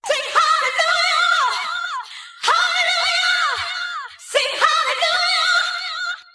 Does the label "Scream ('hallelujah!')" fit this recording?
Yes